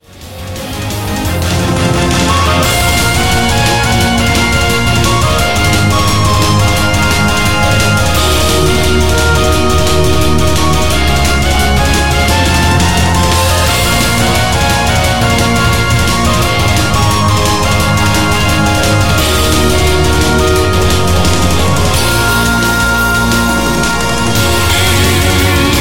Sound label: fall